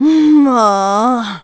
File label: Upset; Mrs. Marie upset (when Link doesn't want to talk); Zelda